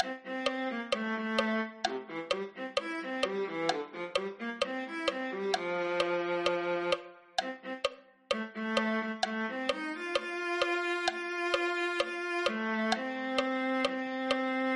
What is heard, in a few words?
viola